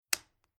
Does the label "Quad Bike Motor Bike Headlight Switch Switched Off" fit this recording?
Yes